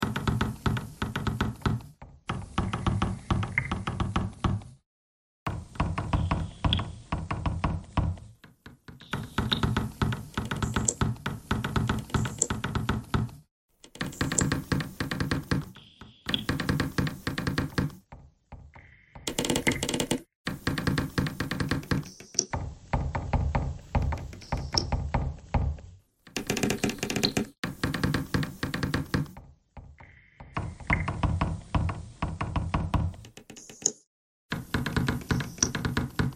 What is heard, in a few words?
bagpipe noise